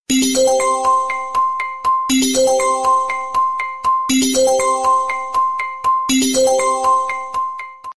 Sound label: i phone latest